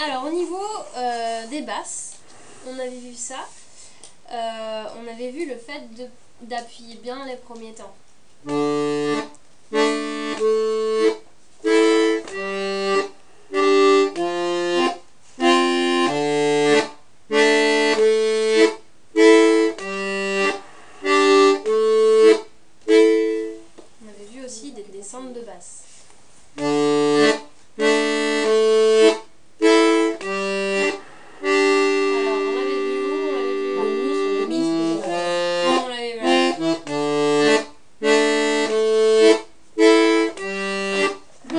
descentes de basses; descente de basses à la main gauche

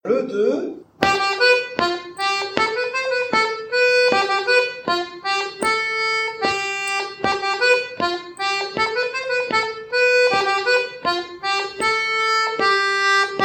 rondeau en chaine; suite de 4 rondeaux en chaîne

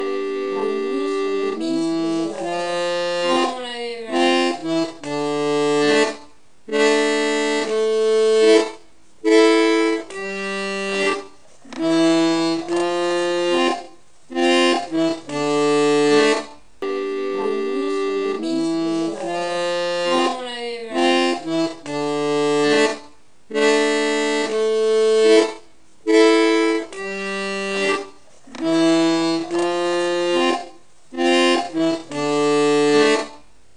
descentes de basses lentement

